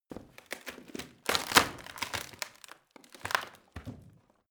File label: rotten wood break